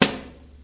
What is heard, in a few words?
flanged sd; single hits